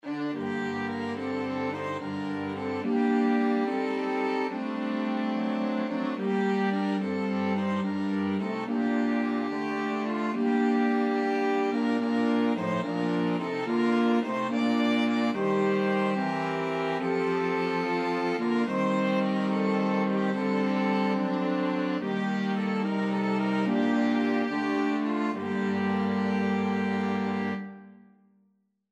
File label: flexible mixed ensemble, 4 players